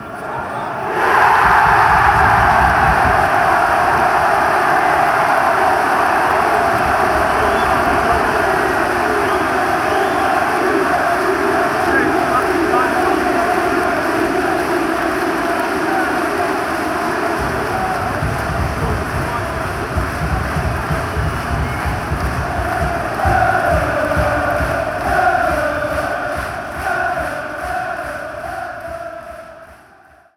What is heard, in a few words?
chants